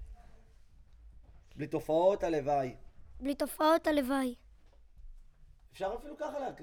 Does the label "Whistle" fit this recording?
No